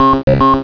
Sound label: new level